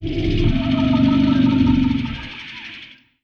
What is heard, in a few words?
rapt click long